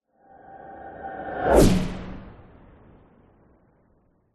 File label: science fiction whoosh element pass by machine vehicle ultra fast